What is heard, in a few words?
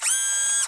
Launcher; Playable Tutorial Level